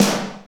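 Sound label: snare, Techno Rock; Northstar; Drumscapes Roland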